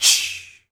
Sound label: FEM PERC, Vocal Jazz FX